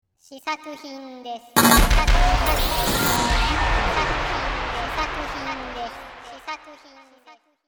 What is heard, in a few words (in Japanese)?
効果音